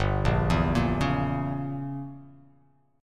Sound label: G Diminished 7th